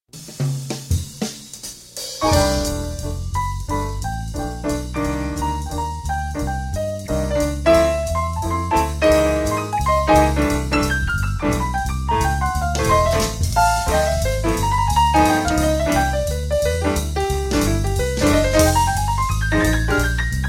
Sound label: Piano, Bass, Drums